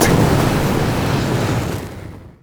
flamethrower; rumble

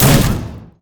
rumble shield

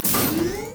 rumble laugh

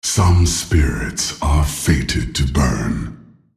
attack; Nasus; Character Voices - English

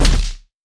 wolfman vs stone; LoL; warwick

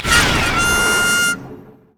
move quick; LoL; super teemo

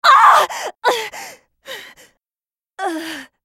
dying; Riven

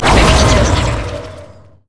grasping roots; Zyra

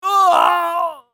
dying; masteryi

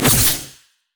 lunge; LoL; fiora

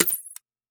blinding dart; LoL; teemo

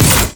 execute; LoL; darius norseking

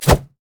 poppy basicattack oc

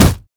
blue mech melee basic attack; minions; LoL

